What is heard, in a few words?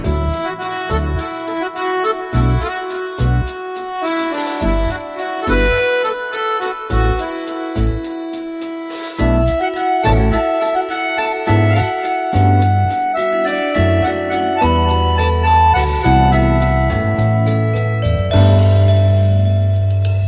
Instrumental Tone; Size Zero; Telugu